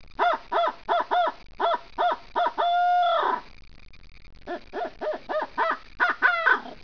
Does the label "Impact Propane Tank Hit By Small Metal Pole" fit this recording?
No